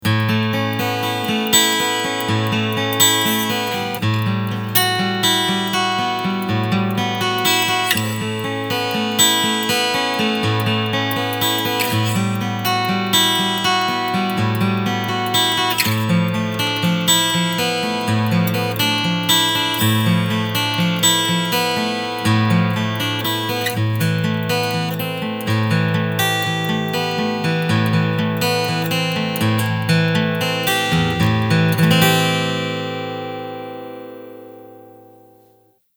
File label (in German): Picking Mikro